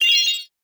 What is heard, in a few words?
coin